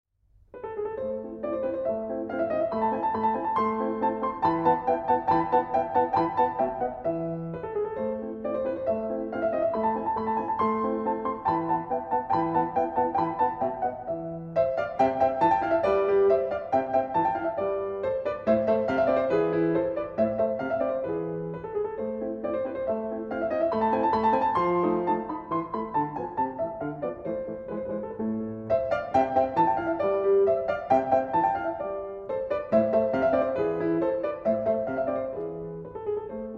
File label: Piano Sonata in A Major, Turkish March, Alla Turca